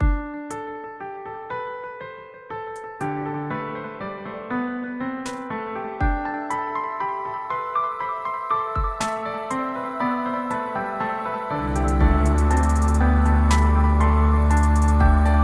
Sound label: Mystery Temple Piano